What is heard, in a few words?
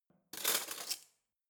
cutlery spoons drawer grab